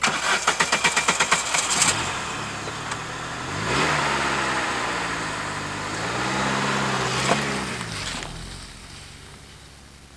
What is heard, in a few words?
start, cars; transpo